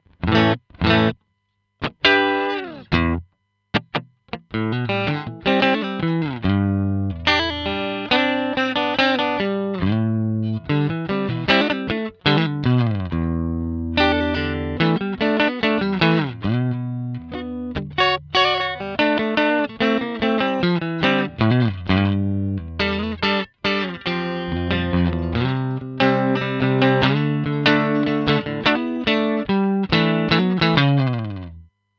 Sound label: Clean; SH2 split